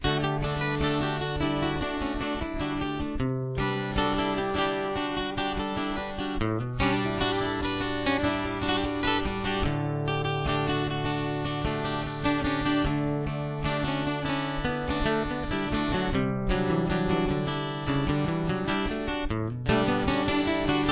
solo

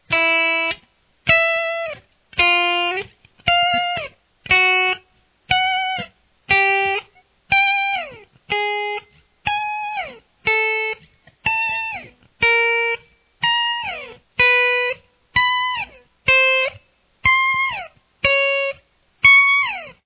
dot inlay exercise

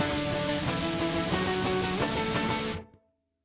transition

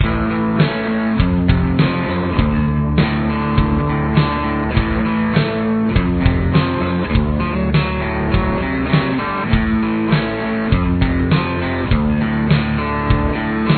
verse guitar

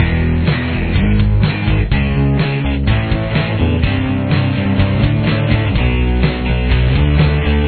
pre-chorus, guitar

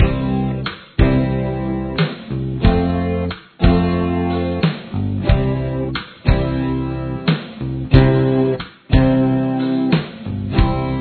backing

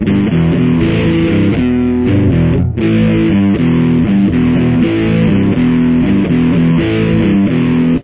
chorus, guitar

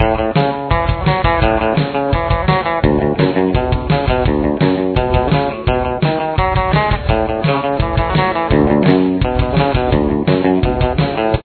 full start stop guitar

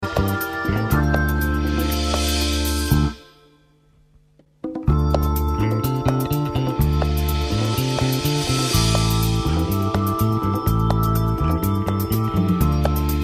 intro solo practice track

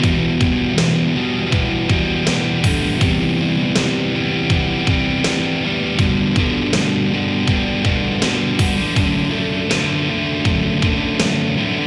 guitar one

